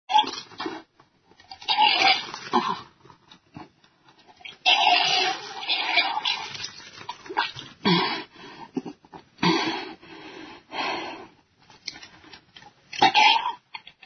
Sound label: barfbowl; sound effects and sound reinforcement